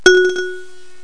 xphone, notes; ClickArt 300,000

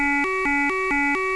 klaxon; Flying Nightmares Demo; Flight Sims

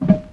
Plumps; Break

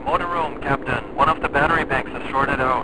Motor Room Damage; U-Boat 1.5.3

